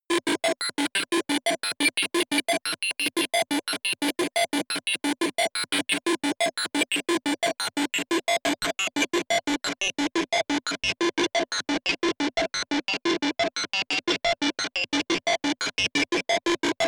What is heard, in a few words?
digital bit